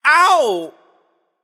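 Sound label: nonverbal ouch; smite; voicepack; merlin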